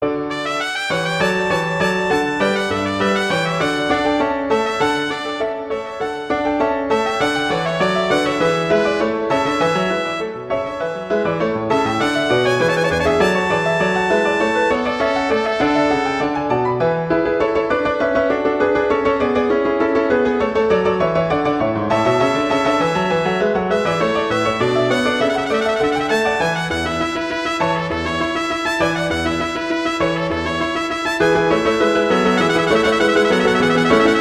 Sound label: Allegro; Fasch; Concerto